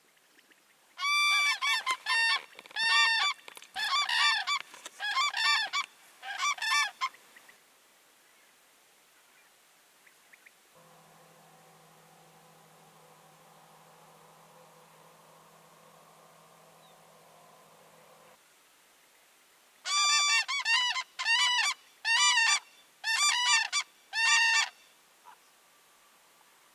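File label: sounds of crane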